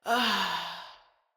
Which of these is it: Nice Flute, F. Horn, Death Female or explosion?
Death Female